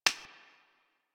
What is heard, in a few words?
Idle Clap; Warframe; Yareli